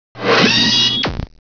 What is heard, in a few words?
gauss charge, weapons